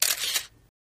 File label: 35mm Camera Auto Win